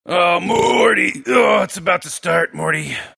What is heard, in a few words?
burp; about to start; announcer dlc rick and morty